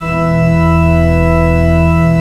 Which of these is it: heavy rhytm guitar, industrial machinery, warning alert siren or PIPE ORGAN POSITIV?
PIPE ORGAN POSITIV